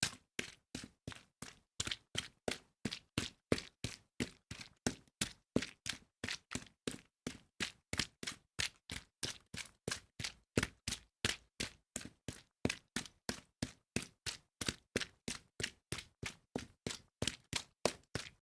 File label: running on wet surface